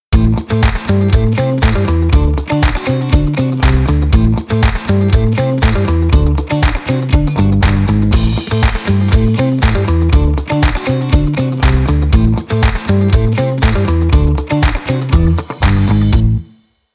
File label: Upbeat Funk Short